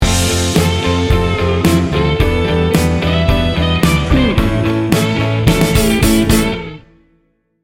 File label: heartwarming sting bumper